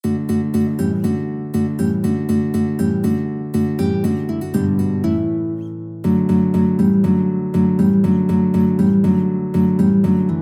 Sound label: guitar duet